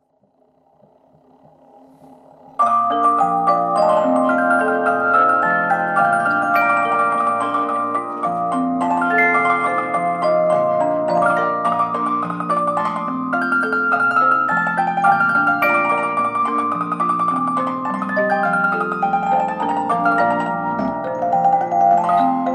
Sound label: musicbox harfe